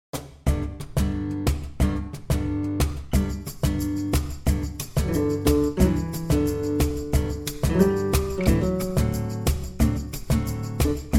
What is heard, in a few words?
acoustic guitar